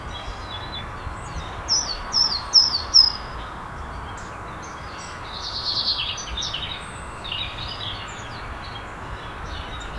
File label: in a garden